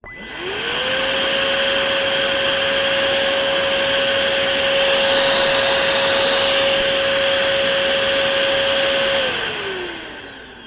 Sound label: household vacuum cleaner cordless on off short; vax